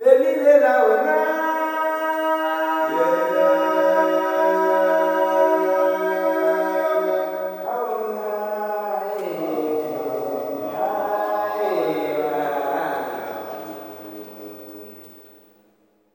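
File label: MALE MOURN, MALE CALLS; Spectrasonics; Heart of Africa 2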